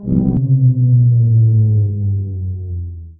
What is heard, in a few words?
power off, energy; source engine; ep2